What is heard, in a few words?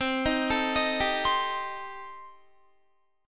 C Minor 6th C Guitar; Cm6 arpeggiated; 20,18,19,20,19,19